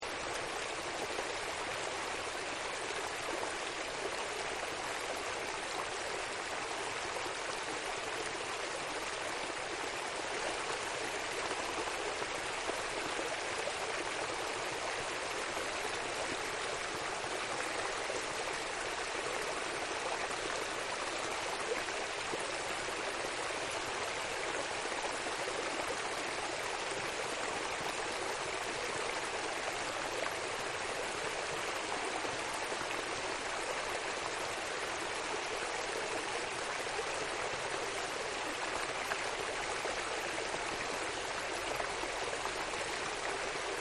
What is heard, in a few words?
Water Stream Small, Small Water Stream Loop; Water Stream Small 03; Ocean Sound Effects; Nature